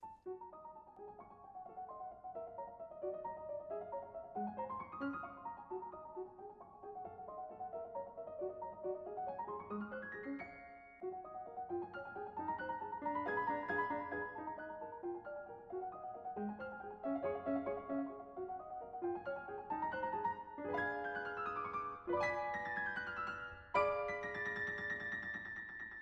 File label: Musical Box; Sibelius